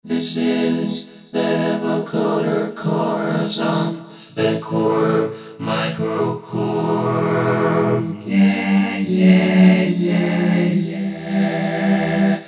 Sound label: Vocoder Chorus, Korg microKORG; Factory Voices